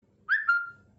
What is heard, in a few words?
Whistle